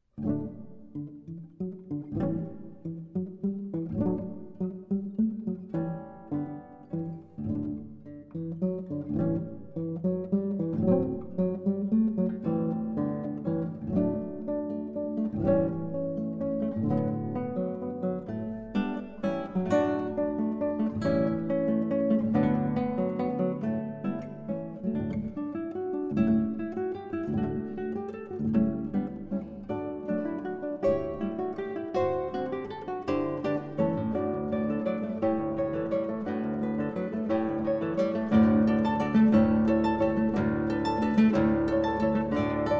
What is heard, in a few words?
guitar duo